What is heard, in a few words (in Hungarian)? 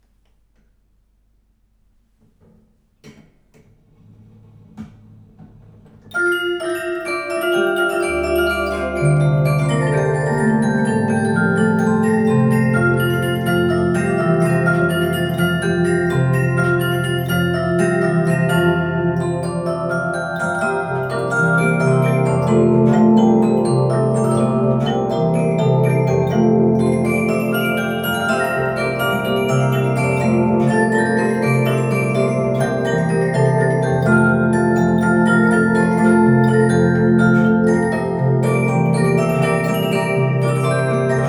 zenedoboz femlemezes; miragrand; 1902 svajc; verklihangmuzeum